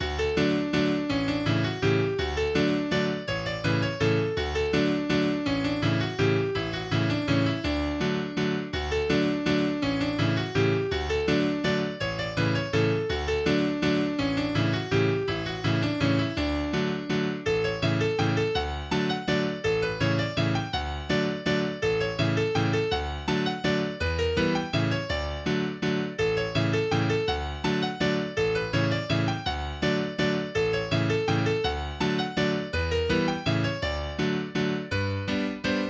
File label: Lord St Vincents Waltz, english dance tunes; eighty english dance tunes